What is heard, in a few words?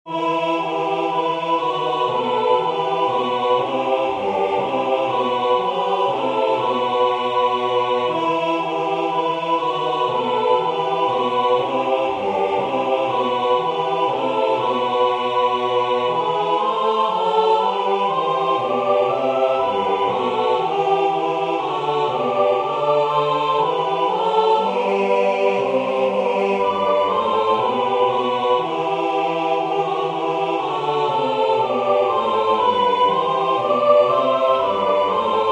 Bass; God Rest